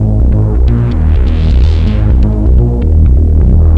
Dark Loop

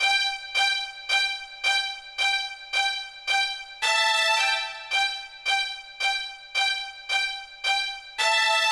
Synt; SYNTH; 110 BPM

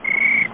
Cricket; Zachary's Zoo

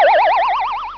Spring; 算数教室 かけ算スタック

